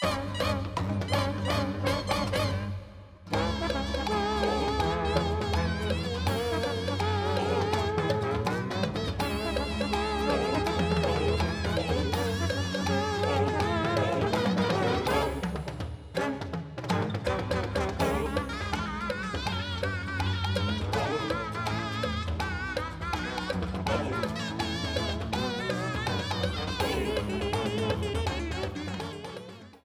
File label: Low Health; Rumble with Wendy